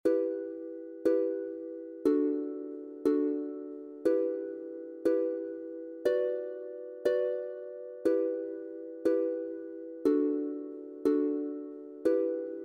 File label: Harp; Goddess